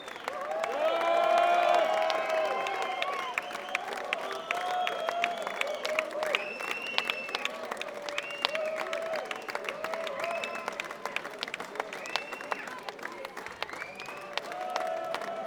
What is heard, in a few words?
encore break; Bruessels, Belgium